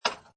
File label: Pinball Sound Clank With Rattle; Video Games (Sound Effects:Games)